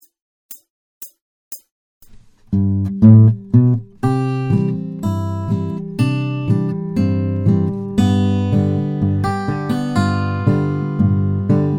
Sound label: blues